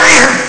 cry; Amiga Soundtracker Sample Packs

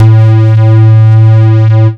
dance, bass; Techno Trance Essentials

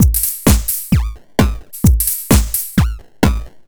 Crushed Beat 130BPM; musicradar

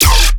bass hit F, Bass Stabs; chonkeymonkey; Virtual Riot

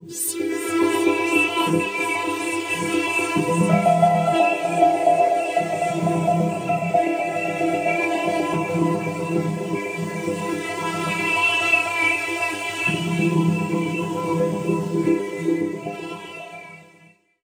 Ambient Panoply E, Atmospheres; Zero-G Ambiosis

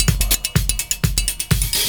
CYMB, Breakbeats

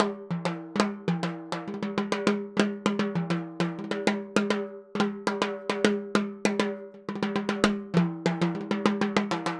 Timbali, Latin Percussion

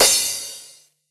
Crash, retro house samples, Drum Hits

